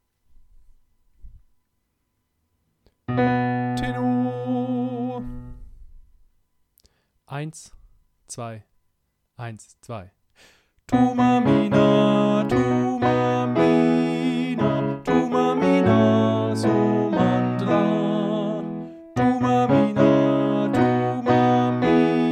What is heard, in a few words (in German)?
Tenor mit Tutti Klavier; Thuma Mina